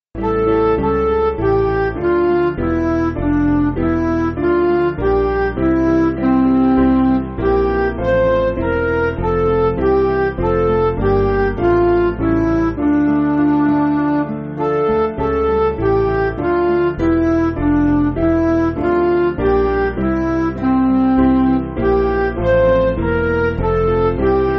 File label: Midi; Think Over O Salves; Ruud